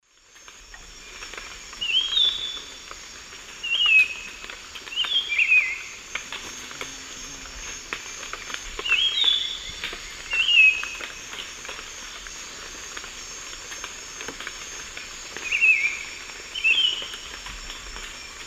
Saltator maximus, song; S. America; Eastern Lowlands

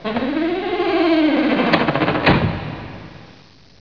DOOR

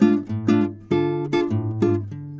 Guitar D, Acoustic

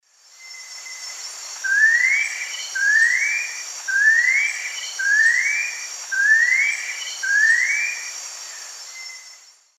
Icterus croconotus, song; S. America; Eastern Lowlands

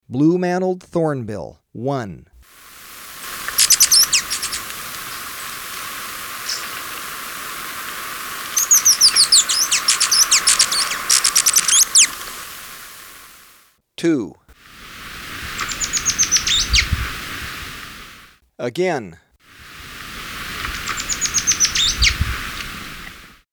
Blue-Mantled Thornbill; S. America

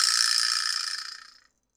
vibraslap

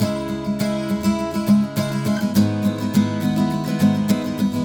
Guitar C# G#, Acoustic

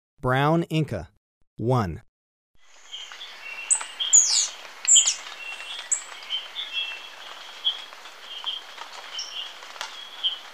Brown Inca, Birds of Ecuador; S. America

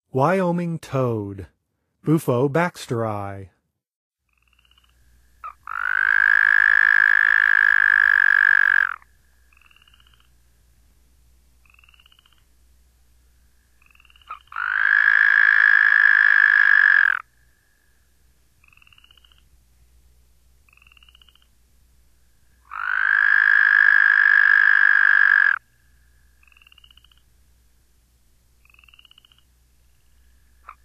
Wyoming Toad; North America; Songs of Frogs & Toads of North America

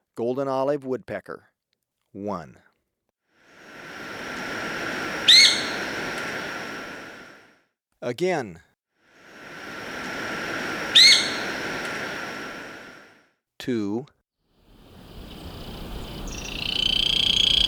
Golden-olive Woodpecker, Birds of Ecuador; S. America

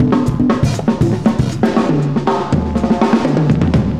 cooling 120, loops, 120bpm

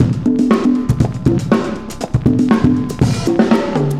cooling 120, loops, 120bpm

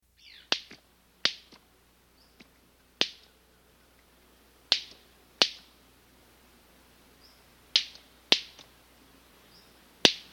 White-Collared Manakin, S. America

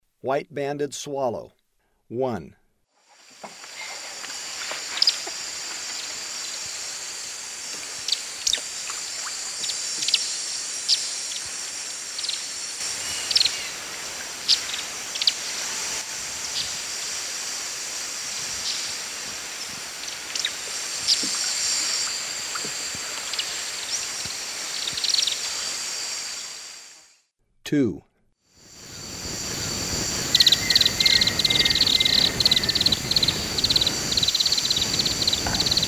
White-banded Swallow; S. America; Eastern Lowlands

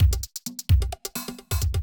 electro kit 130

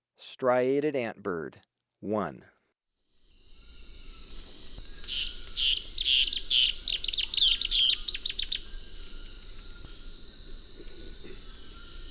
Striated Antbird; S. America

